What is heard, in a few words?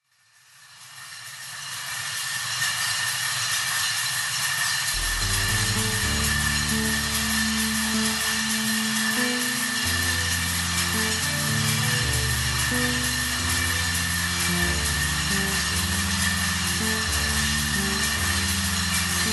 calais soundscape